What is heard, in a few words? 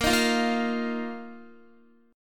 Bb Suspended 4th Bb down Guitar; strummed; tuning 4E,4A,5D,5G,5C,6F